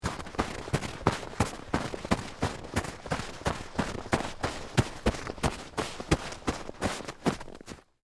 Snow Run, Footsteps; Footstep Sound Effects; Footsteps (Sound Effects:Human); BlastWave

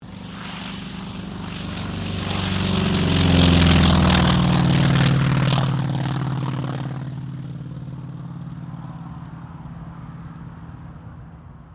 AIRCRAFT T-28D TROJAN TAKEOFF

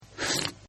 Slurp, Drinking; Human; Partners In Rhyme

